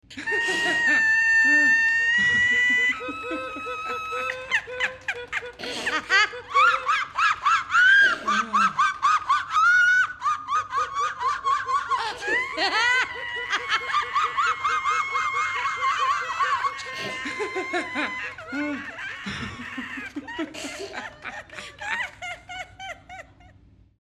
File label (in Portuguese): Hihihi, Risadas Individuais